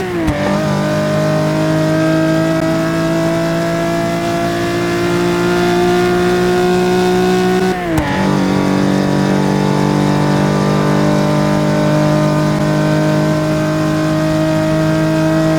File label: fourth cruise, vehicles; ferrari 365 gts